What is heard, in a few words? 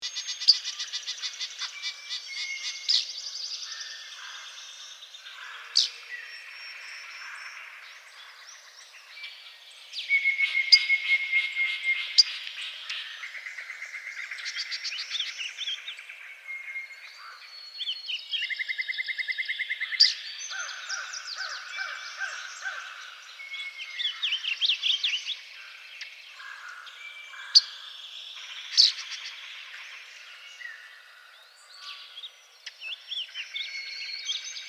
Blue Grosbeak, Passerina caerulea; cardinals tanagers grosbeaks